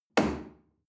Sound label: chair wood place medium